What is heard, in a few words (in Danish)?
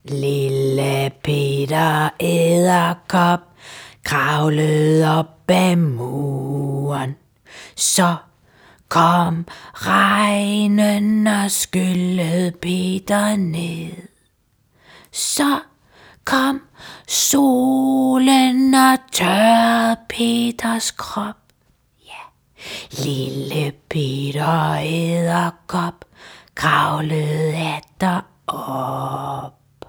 lille peter edderkop dansk; SangeOverSundet SOS